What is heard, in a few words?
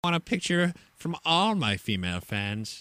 female fans